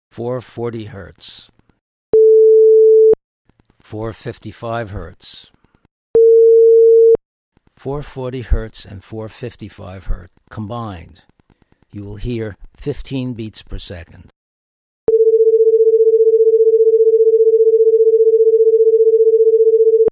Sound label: Beats